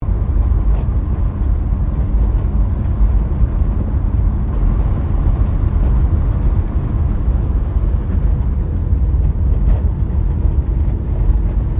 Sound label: CAR HOLDEN FE INTERIOR CRUISE LOOP, Classic Australian cars; Holden Fe 1957; Vehicles